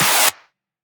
clap; LiLA'c Records PsyTrance Sounds 01

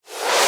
Mini Sweep

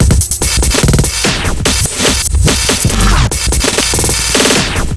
FILL, PsyTrance

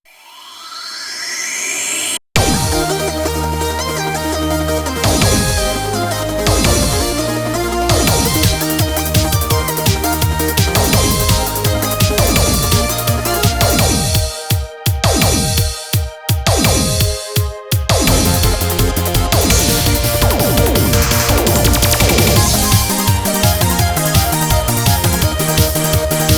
off vocal

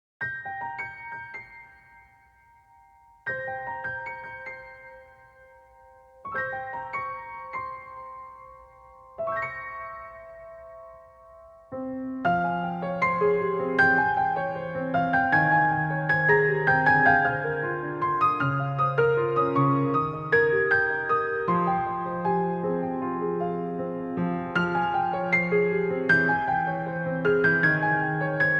instrumental

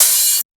Open HH, PsyTrance; Cymbal

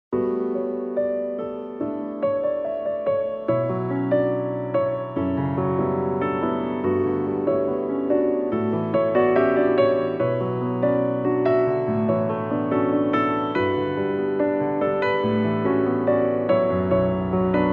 piano ballad

